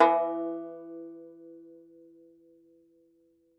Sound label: Tenor Banjo